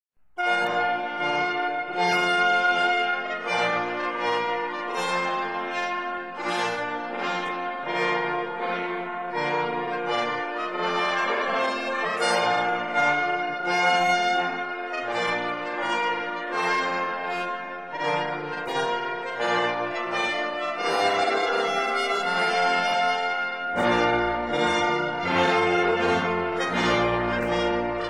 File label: Trumpet Tune; Purcell